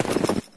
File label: player snow; Source Engine; dod